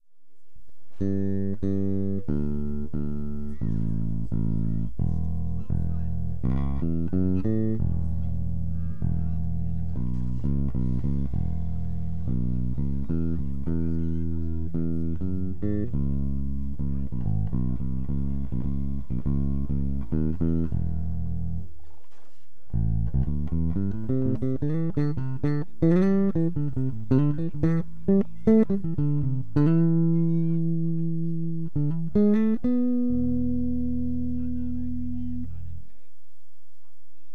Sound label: PYRAMID SS 45-105